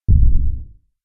horror footstep massive distant heavy dinasour monster giant; zapsplat